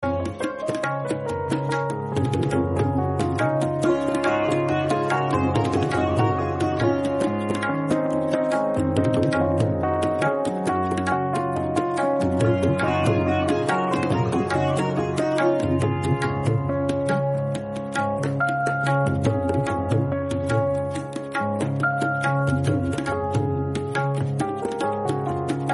Instrumental

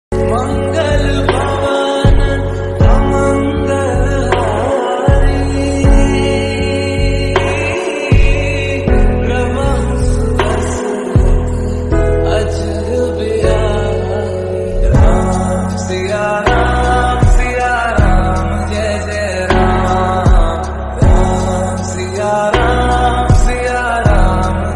Lofi Slowed Devotional

